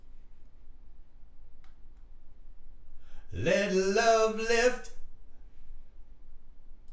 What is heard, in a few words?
vocals